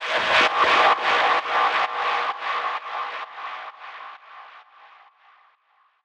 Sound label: Fx 130, Techno; Loops; Ghosthack; Free Techno Sounds 2024